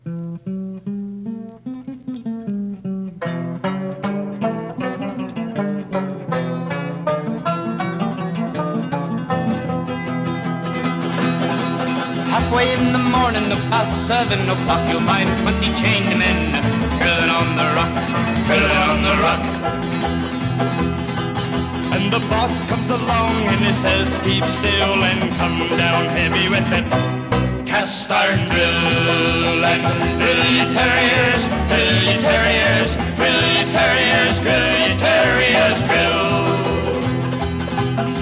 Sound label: Drill Ye Tarriers